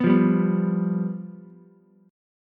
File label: F Suspended 2nd Flat 5th Ukulele, chord; strummed; 4,5,3,2; JGuitar